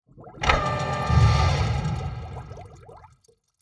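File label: drill, volcano harvesting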